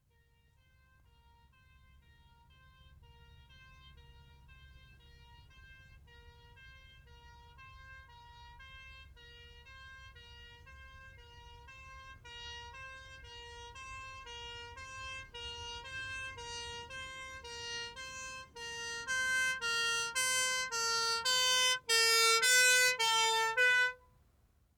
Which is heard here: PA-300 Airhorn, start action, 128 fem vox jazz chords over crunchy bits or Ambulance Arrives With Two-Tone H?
Ambulance Arrives With Two-Tone H